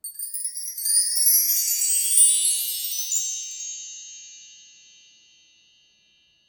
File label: Chimes Low To High